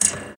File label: FX Dance